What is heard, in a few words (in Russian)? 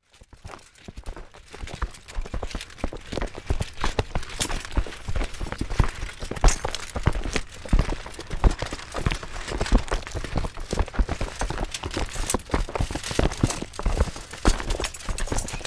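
Отряд солдат передвигается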